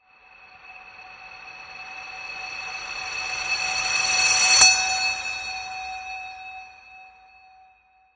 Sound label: Magic Cheer Bell Reverse